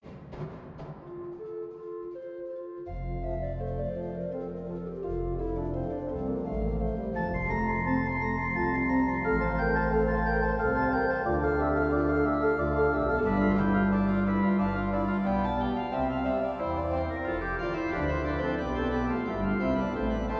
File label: Invention in F major; J.S. Bach - Invention in F major, BWV 779 (excerpt); organteq